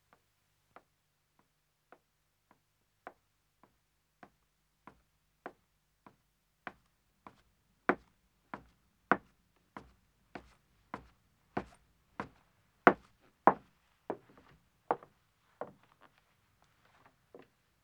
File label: Wooden Stairs Ascending, 1 Wom, Footsteps; BBC Sound Effects Library; Footsteps II